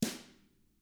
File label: DW1BR MFR L, Drums, BRUSHR